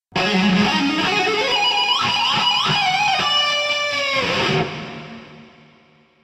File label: lick; brutal legend